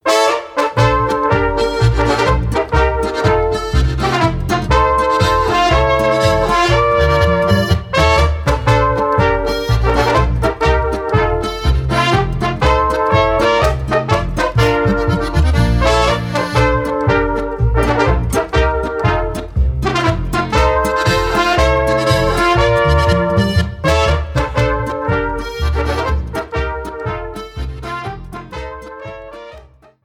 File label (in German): marsch